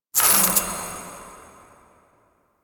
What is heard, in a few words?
Boon Reroll; Warframe; UI; Duviri